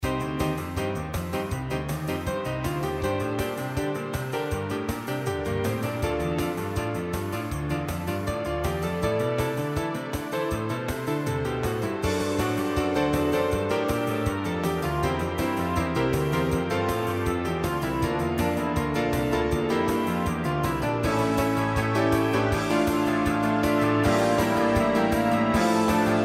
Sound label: Rock Sonata